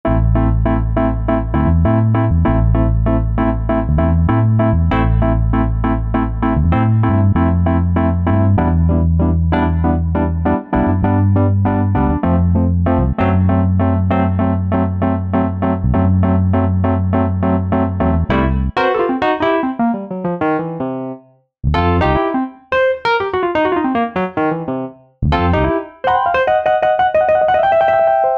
Bass & Wurlitzer Electric Piano; synthesizer; Guess the synthesizer game

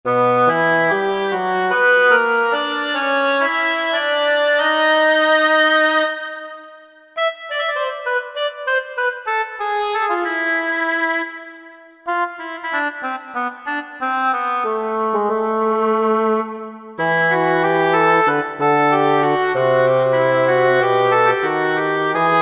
Woodwinds, Kurzweil K2000; Kurzweil K2000 Orchestral ROM

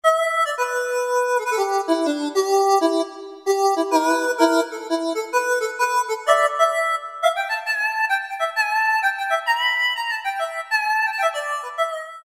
Blues, Yamaha TG33; Factory Presets; Preset bank 1

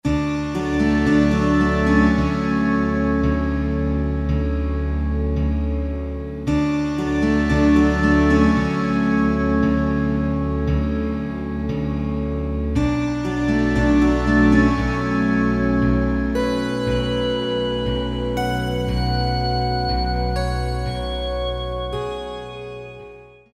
Echo, Yamaha TG33; Factory Presets; Preset bank 1

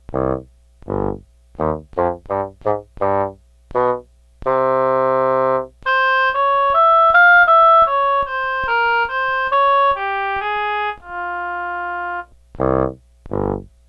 BASSOON OBOE

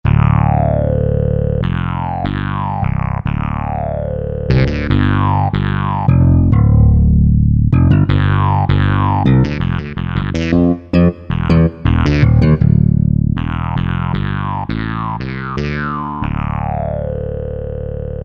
Killer Bass, Korg T3

